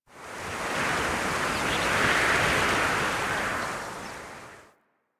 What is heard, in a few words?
Forest Wind Whistle; Warframe; Grineer Forest